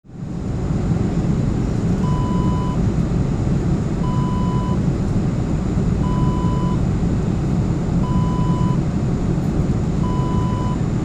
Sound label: airplane interior